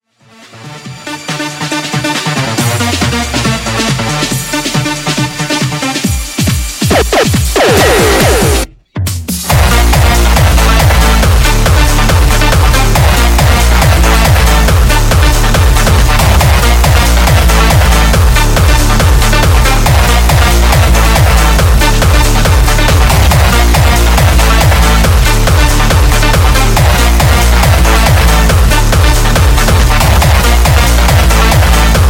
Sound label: Dark Triad hard